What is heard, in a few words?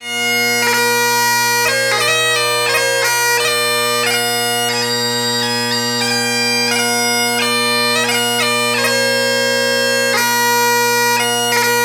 Traditional Irish